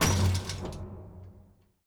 Lift Arrive; gamerip

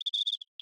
Env Void Cicada 3 Singles; viewfinder

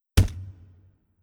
Impact Gore; Impact Gore3 Imp1

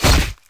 Sword Hit Enemy; unsighted; enemies

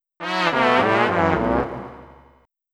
Failure; Hey You, Pikachu!; N64

